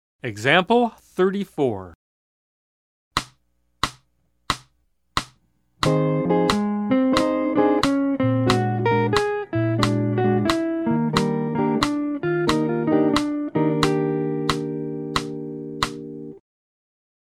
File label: Jazz